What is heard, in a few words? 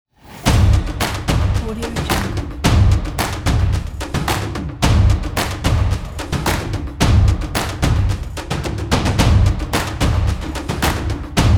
beat drums